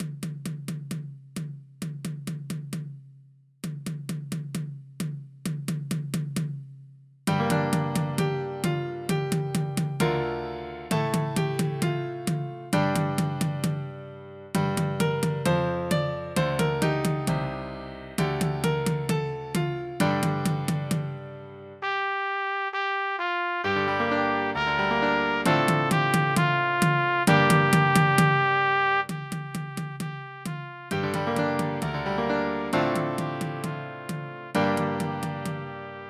Truvérska pieseň, pre trúbku a klav.

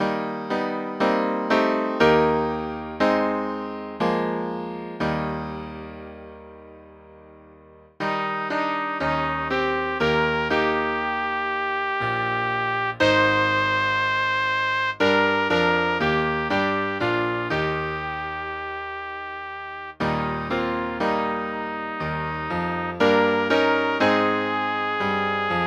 Zbohom ostávajte, pre tr. a klav.

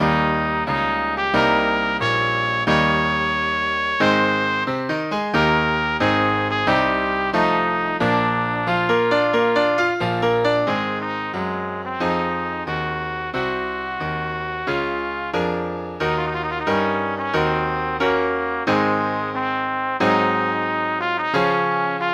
Introdukcia a trilkový valčík - pre trúbku a klavír

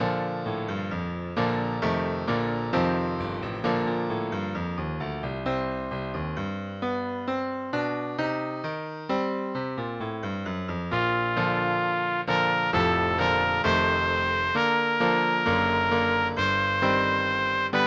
pre trúbku a klavír

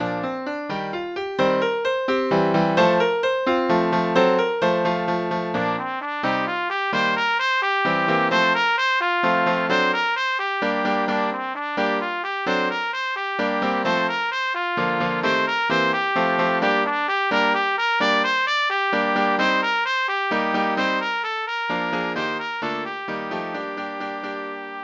Hon na líšku pre trúbku a klavír

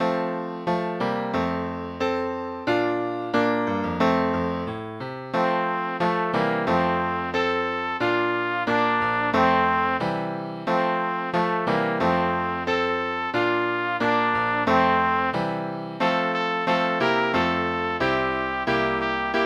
U Brna louka široká - pre trúbku a klavír

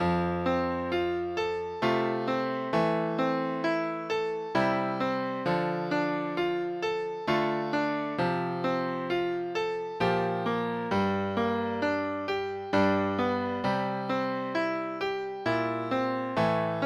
Malé prelúdium - pre trúbku a klavír

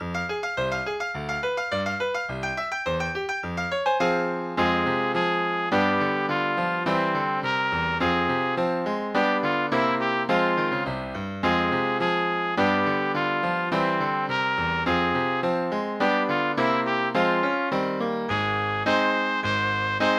A ja taká dzivočka - pre trúbku a klavír

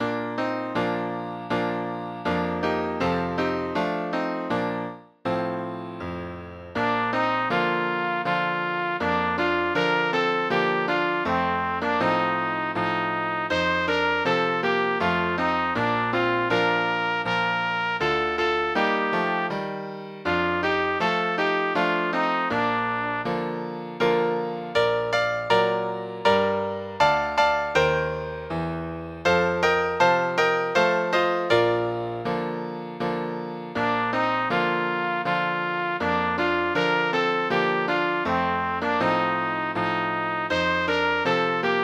pre trúbku a klavír; Když jsem já šel, tou putimskou branou

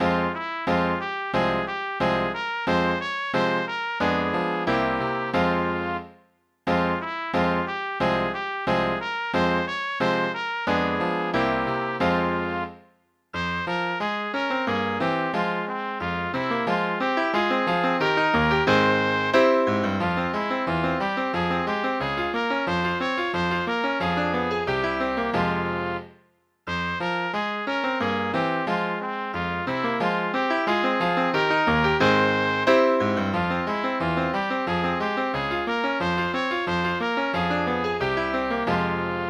ja do hory nepôjdem - pre trúbku a klavír

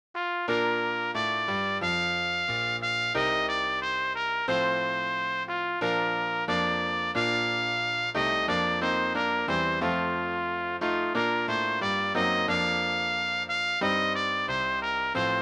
Intráda pre trúbku a klavír

